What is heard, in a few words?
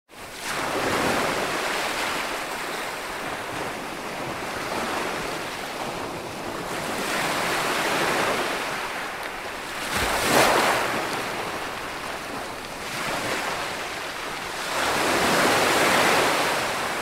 bandstand, sounds of mumbai